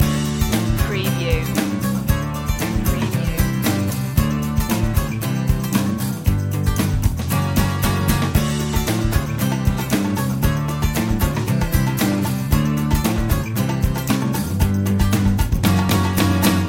country road chase loop

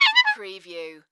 Clarinet Squeak

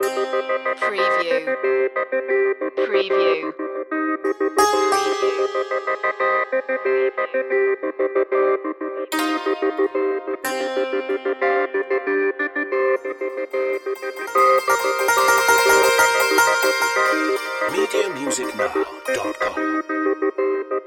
dulcimer surprise loop

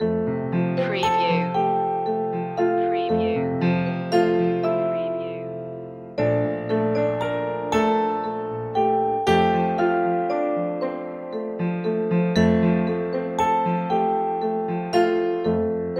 rock a bye baby instr loop

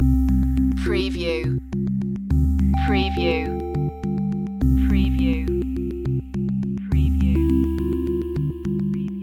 loop, chill

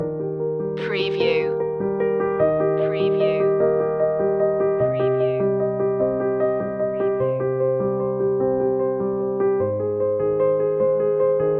intimate piano loop

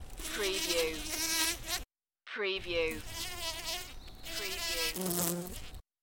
Bumble Bee Buzzing In Flower

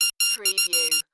Digital Incoming Signal Fax Receive

